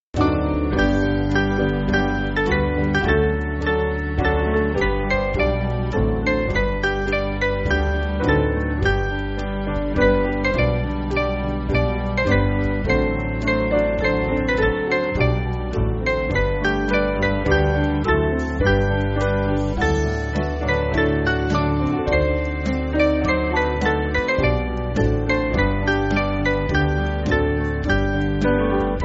Band, church music

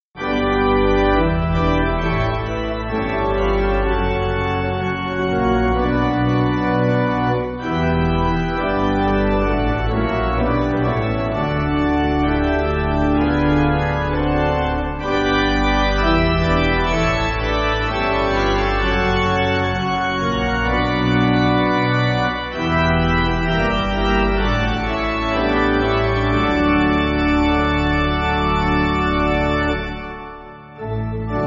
Pipe, church music

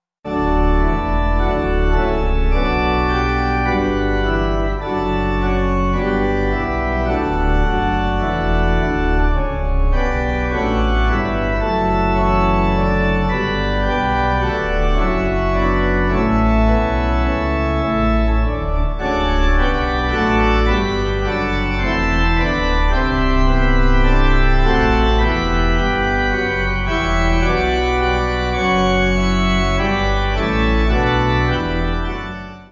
Lord Of All Good, Pipe

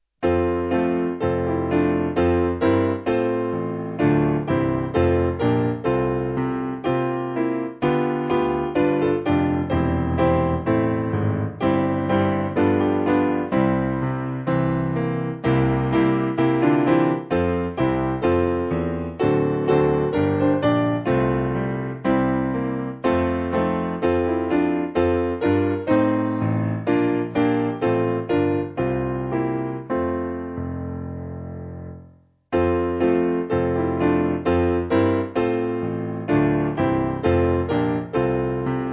SPiano; St Kevin

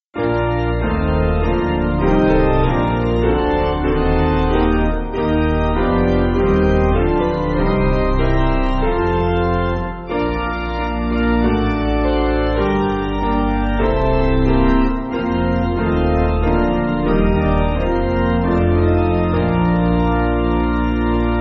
Chester, Piano; Jesus Calls Us

